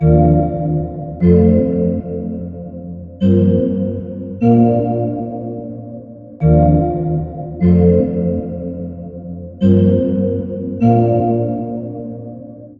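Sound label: synth loop soft touch 150 G minor; Virtual Riot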